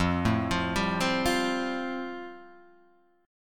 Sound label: F Major Add 9th, Guitar DADGAD